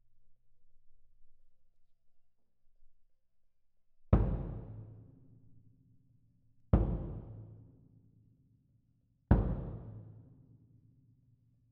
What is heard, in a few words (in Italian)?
Cassa Main